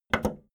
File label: video tape player plastic play click fast